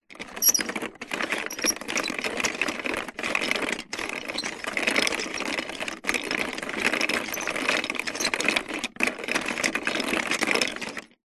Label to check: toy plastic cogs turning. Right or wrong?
Right